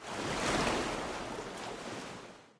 victory waterstep; smite; poseidon

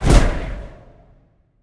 Cast Arcane; smite; god merlin

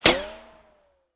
landing ricochet; smite; Ares

